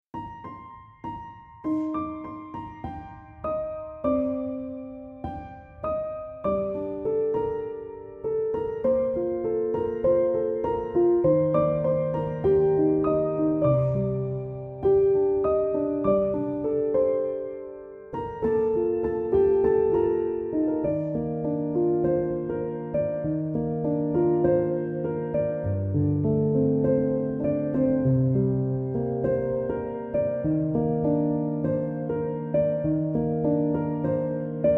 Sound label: Piano Instrumental